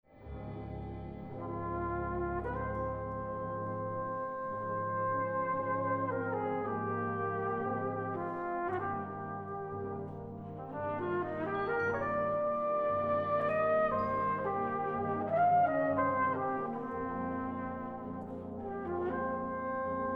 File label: Tango, Brass Band